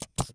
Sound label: item suction attach